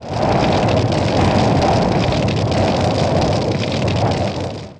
fire loop; RYL 2

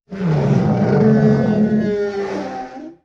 Dinosaur Design, Vocals - Monster

